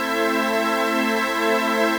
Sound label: warrior; ALLSTARS